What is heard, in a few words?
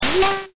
sing